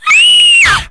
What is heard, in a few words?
SCREAM, tono di notifica urlo donna; per Cellulari Android o Iphone